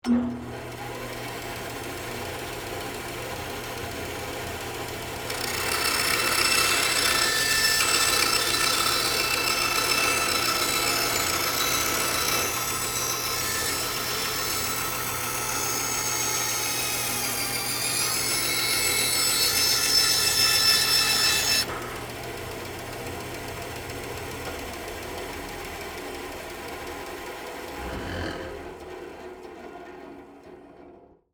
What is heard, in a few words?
Band Saw Cut 1x4, Industry, Soft, Wood, On, Run, Off; Motor